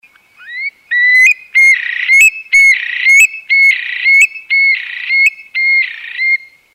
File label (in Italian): verso occhione; fauna